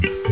CLICK

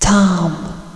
TOM

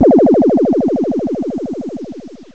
BLUBB

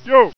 JEEP; LEDWARS

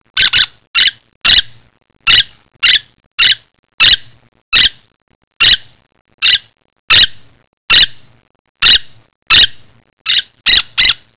BIRDS

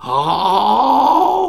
"oooohhhh"

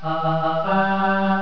ta ta taa

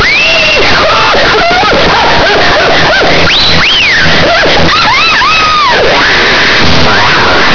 catcall

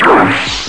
BLOW BACK

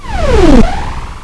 ZAP WING; HIGHTECH

